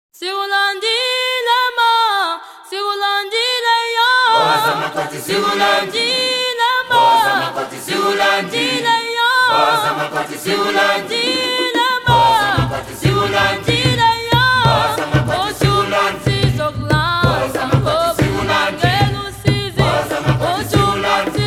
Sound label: gospel choir